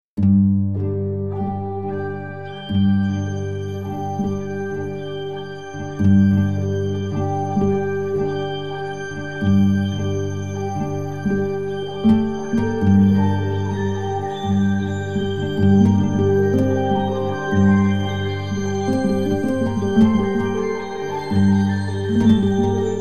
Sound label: delay cascades